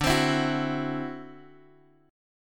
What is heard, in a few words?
D 9th D, guitar; D9 Chord {X 5 X 5 5 2} strummed